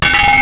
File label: Bang On A Pan; Cymatics; Foley Wavetables Vol 1; Serum